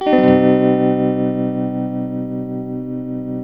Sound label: TELTMUMIN C#, TELE CHORDS, Guitars; Spectrasonics; Hans Zimmer Guitars VOL-1